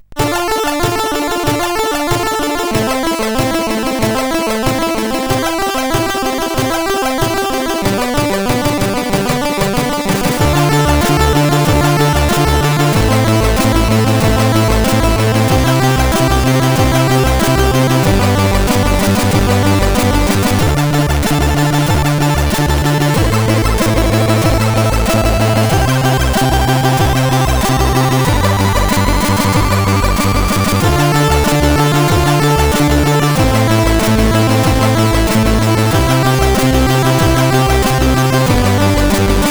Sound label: Rap, CSG8580R5